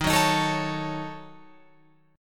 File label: Eb Minor 7th Flat 5th, Guitar Open F; Ebm7b5 strummed